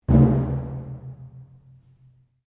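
Drum Taiko Odaiko mezzo forte, Large Japanese Taiko Drum; Miscellaneous Musical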